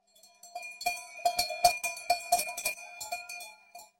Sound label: amb cowbell; tourismustal